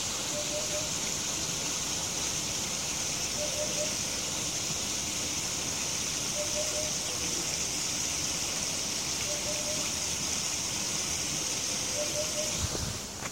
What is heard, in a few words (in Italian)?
verso upupa